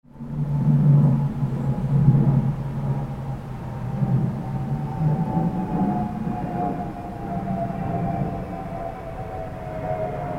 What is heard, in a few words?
Wind Chimes Day Ambience